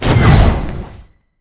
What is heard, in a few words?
fireball; megaspud